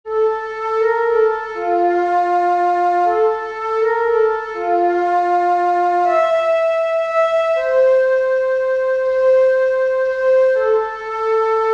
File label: flute